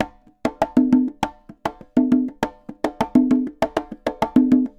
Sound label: Congas Bomba 100, Drums & Percussion, 100 bpm; Best Service; Festa Latina VOL-1